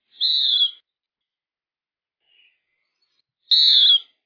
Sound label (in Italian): uccelli senza rumore; audacity; rimozione rumore